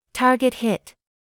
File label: target hit; QuantumTerminal